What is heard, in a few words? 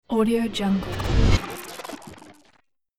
glitch future